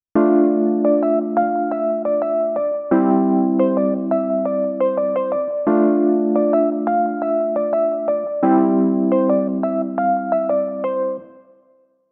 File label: Reface CP Wr; yamaha